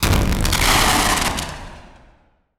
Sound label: Transition Blast Distortion; Adobe; Production Element